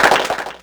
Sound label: Clapbox Pitch; Ableton Foundation FreePacks